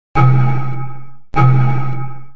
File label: gong; bmw